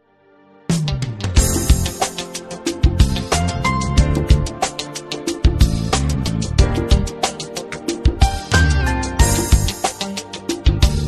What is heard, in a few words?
Nazainam [Instrumental]